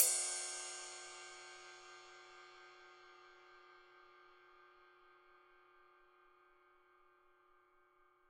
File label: ride; pop-modern